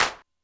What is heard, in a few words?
Gtd Claps, Alesis D4 drum machine